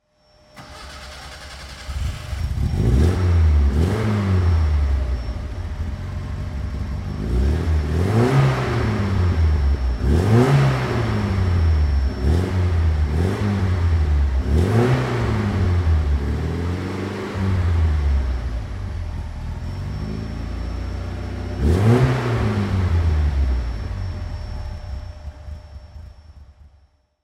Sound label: Alfa Romeo Giulia 1300 ti